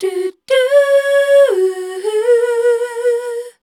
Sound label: #F, Voice Spectral; MELODIC; Best Service; Voice Spectral II